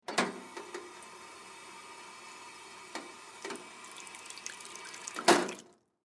Water Drinking Fountain On Run Off; Kitchen; Household

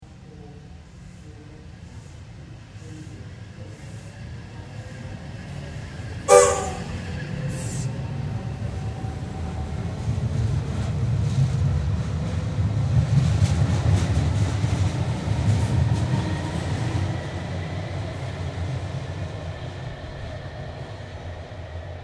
Train Melbourne Exterior Leaves Station; Public Train in Melbourne; Train Sound Effects; Vehicles

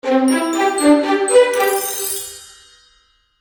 Magical Music Finale, Cartoon Music Sound Effects

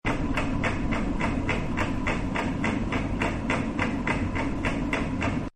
Ceiling Fan; Ceiling Fan Loop1; Fan Sound Effects; Electronics

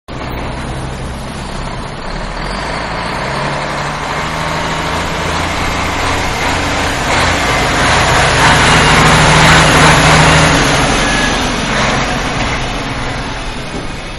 Dump Truck Pass By At Construction Site; Trucks (Sound Effects:Vehicles); Truck Dump-Pass By-At Construction Site; Manhattan Production Music

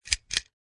Light Switch Pull String; Switches; Objects; Lynne Music

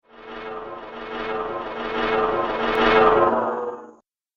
A Slow Tired Whoosh; Andrew Fleming

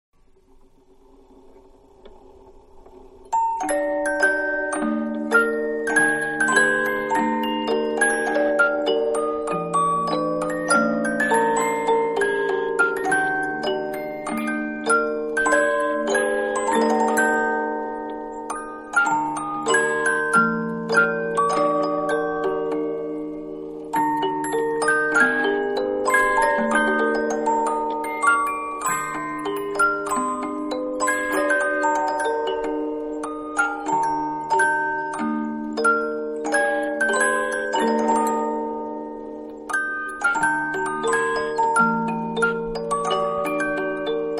Music Box Tune; Toy Melodies (Sound Effects:Toys); Music Box-Tune 3; Manhattan Production Music

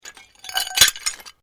Glass move

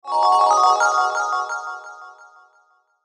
Logo Bells Delay Low; Sonic Logo Sound Effects; Blastwave

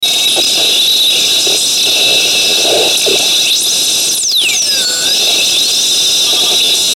Static Radio Sweep; Production Element Imaging Element Accent Transition; Blastwave; Static Sound Effects

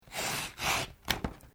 PAPER CUT SHORT; Material components for constructing more complex sounds; Sound Effects:Materials; Foundation3

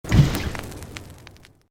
Created Magic Spell Fireball Impact

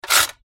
Typewriter Bar Slide Antique; Historical; Typing; Offices; Technology; Blastwave

